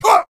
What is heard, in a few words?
heavy taunt exert